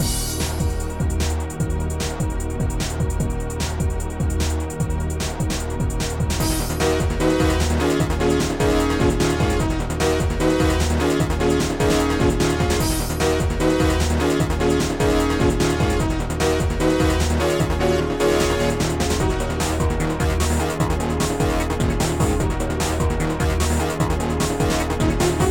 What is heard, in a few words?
tune